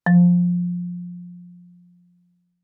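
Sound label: Marimba yarn fortissimo F3; MIS Pitches - 2014; Percussion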